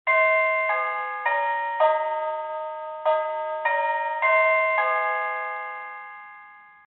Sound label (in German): Klingelton